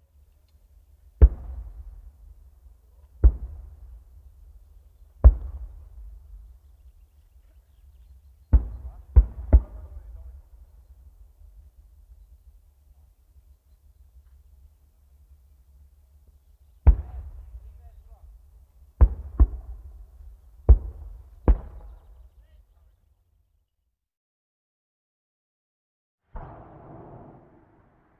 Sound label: Mortar Cannon; Sound Ideas; Guns